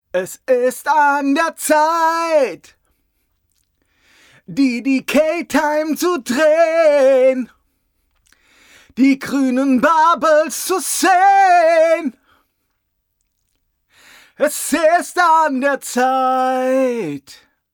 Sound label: Vocals dry